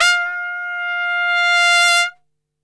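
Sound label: F 3 trumpet; Best Service; Brass Super Section; TRP SWL; 90 s Sample CDs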